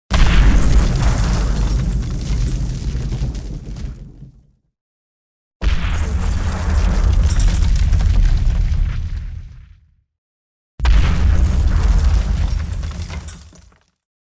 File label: explosions